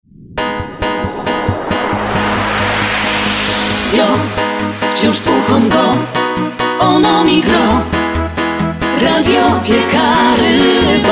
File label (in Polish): Jingiel; radio piekary wraz z rmf fm